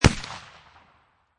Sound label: Rifle Thompson Center Encore 209 Muzzle Loader 50 Cal Single Shot At 45'